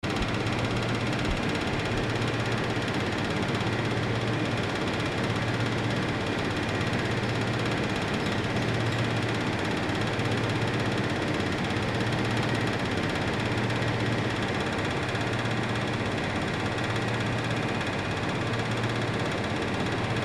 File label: Morning engines, North India